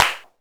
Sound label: Bass Clap, Jomox Airbase99